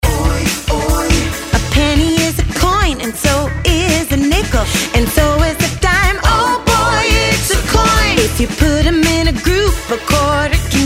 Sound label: Oi Song Coin; kindergarten grade 1 2 letter sound